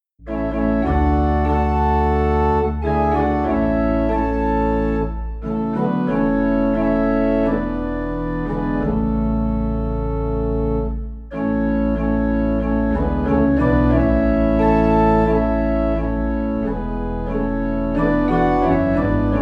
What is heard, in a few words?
Organ Low in D; Savior Like a Shepherd Lead Us